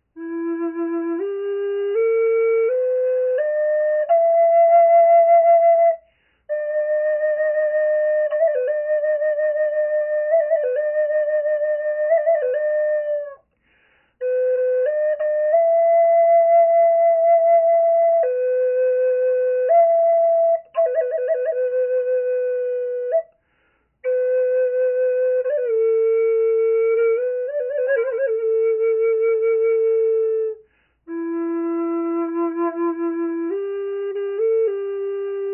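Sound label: natural, flute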